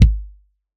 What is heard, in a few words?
Dub Kick